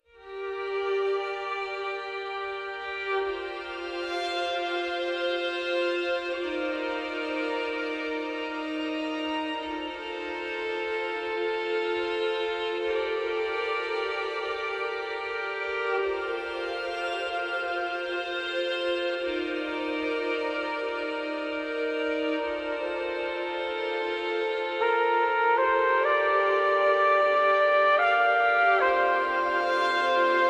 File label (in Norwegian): Theme Flugelhornet; Unravel gamerip 2016